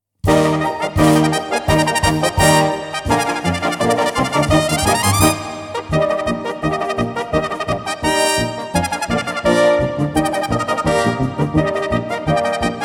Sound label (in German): Trompeten Galopp